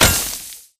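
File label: Impact Pulse Blade Sand; Titanfall 2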